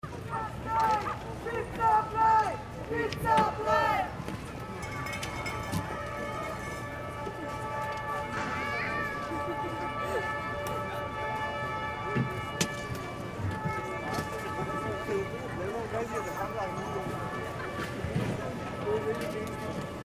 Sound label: atmo loop